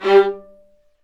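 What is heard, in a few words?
Marcato, Strings; Vlas Marcato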